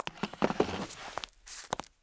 vstal; Mafie 1

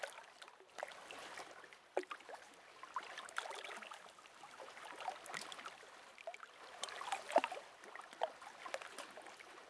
vana; Mafie 1

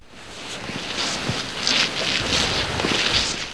plazeni; Mafie 1

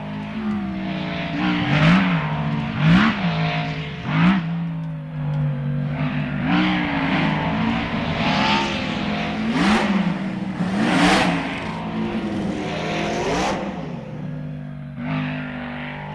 turovani kolem; Mafie 1

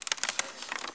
vrznuti; Mafie 1

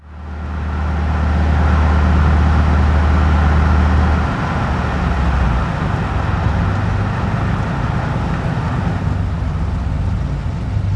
auto; Mafie 1

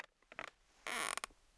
vrzani; Mafie 1

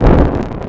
zazeh; Mafie 1

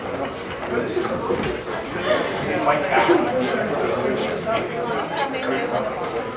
ambi bar; Mafie 1

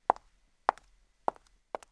kroky; Mafie 1 cz

